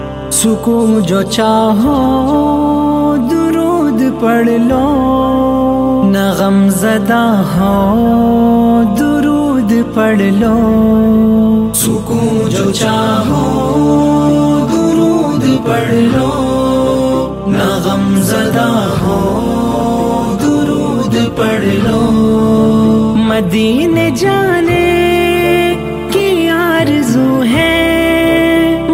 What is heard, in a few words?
Islamic